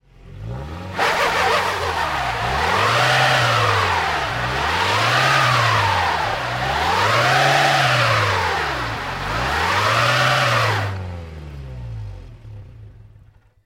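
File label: car skidding on ice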